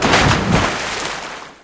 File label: pontoon splash, airboat; Source Engine; video game; vehicles